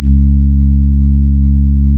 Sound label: REED C#; Big Fish Audio; Synclav FM